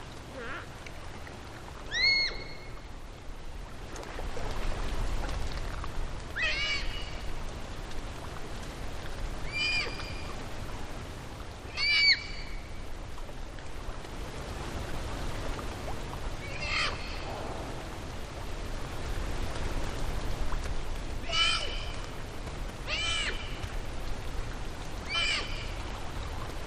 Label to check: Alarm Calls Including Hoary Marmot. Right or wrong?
Right